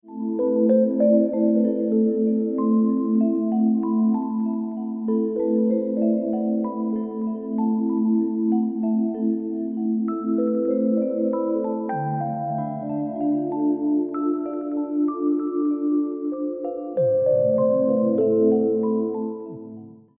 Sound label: SOFT AWAKENING